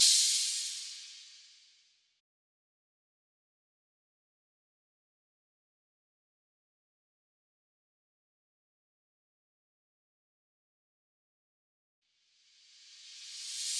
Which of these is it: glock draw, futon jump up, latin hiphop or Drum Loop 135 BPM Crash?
Drum Loop 135 BPM Crash